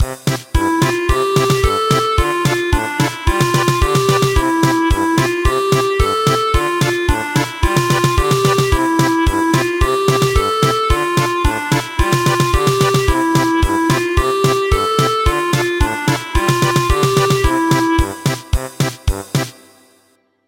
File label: German Polka; El virolet